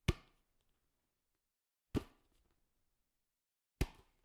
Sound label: volleyball slap on ball